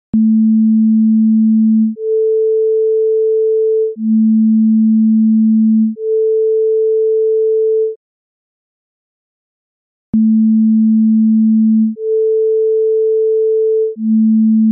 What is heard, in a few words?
220Hz 440Hz